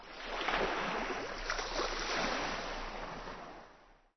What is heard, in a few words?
Wave, terrain; Kings